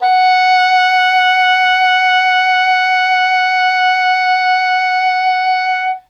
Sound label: English horn F#5; Q Up Arts; Denny Jaeger Private Collection